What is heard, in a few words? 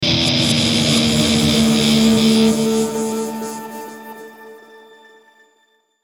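Musical Element, Drum Snare March; Blastwave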